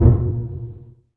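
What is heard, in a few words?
bass pizzicato A#2, Virtuoso Strings; ILIO; Kirk Hunter Virtuoso Strings VOL-3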